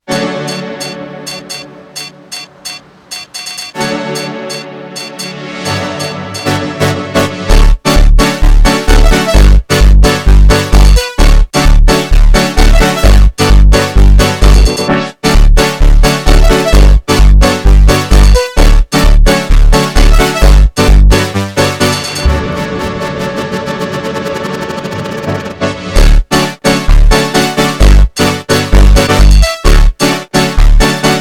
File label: instrumental